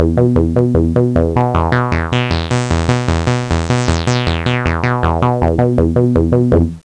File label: 303 saw like